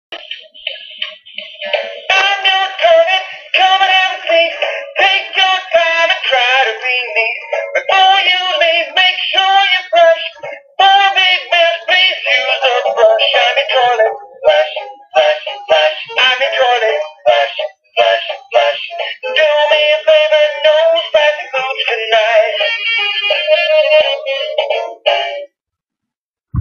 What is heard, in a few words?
toilet song